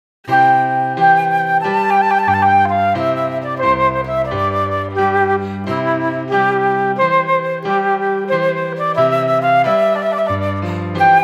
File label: trumpet tune; flutepiano